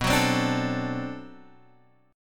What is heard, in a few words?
D 7th, B